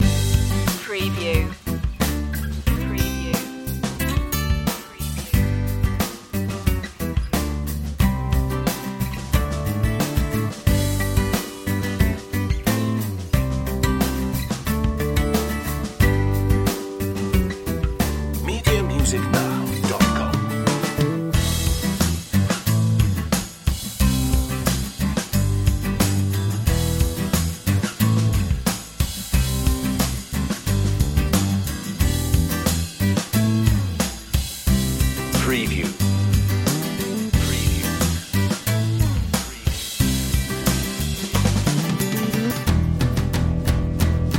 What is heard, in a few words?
happy journeys loop